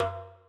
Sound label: Tom